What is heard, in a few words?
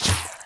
Plant Turret Spit